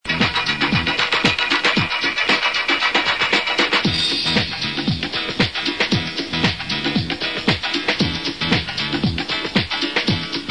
rhumba piano

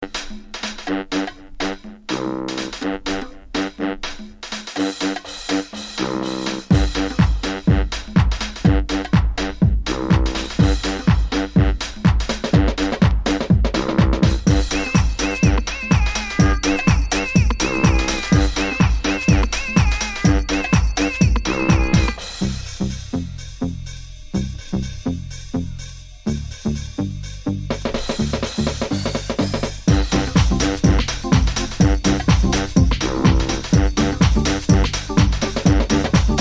sax

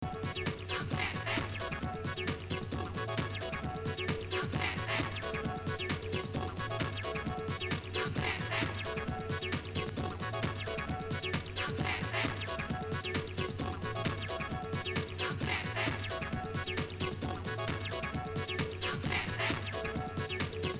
trance dance

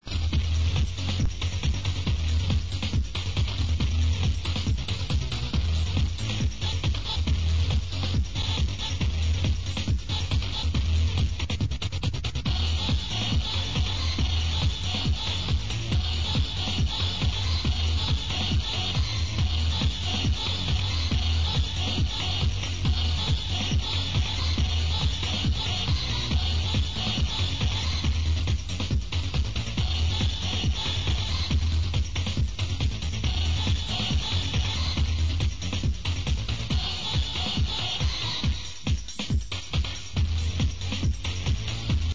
techno

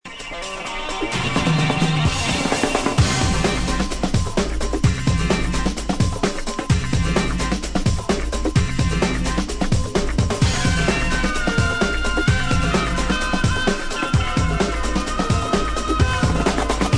mouthorgan indie

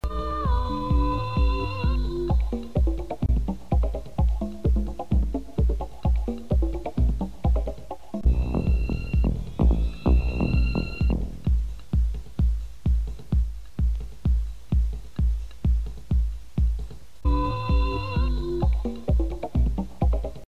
bassy

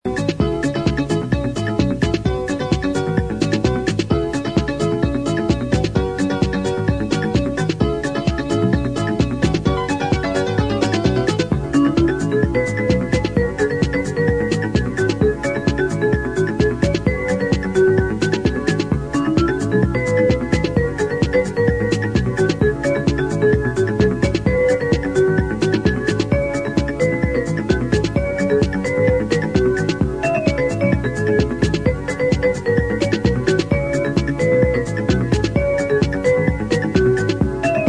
piano

